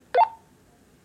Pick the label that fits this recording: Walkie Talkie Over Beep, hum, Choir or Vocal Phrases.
Walkie Talkie Over Beep